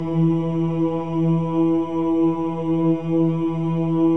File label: CHOIR OOS, E3; Spectrasonics; Symphony of Voices